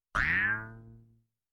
Funny cartoon jumping sound effect

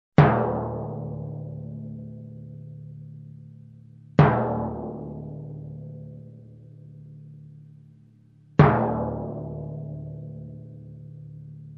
Multiple single timpani hits

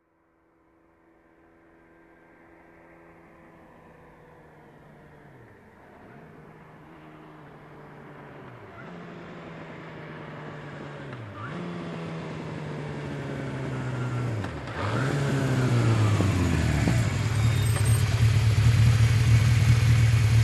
Motorcycle approaching and stopping

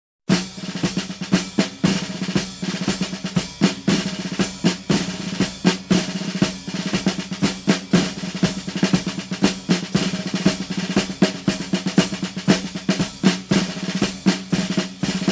Marching drum rhythm

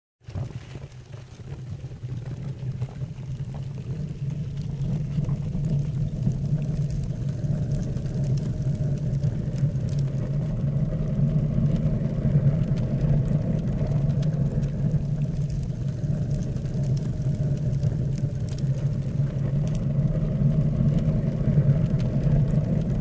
Fire crackling close and flamethrower in distance

